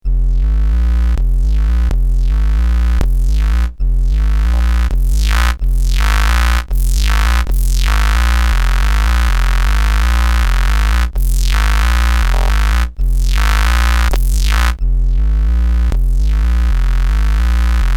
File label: Pulse Bass, HardSID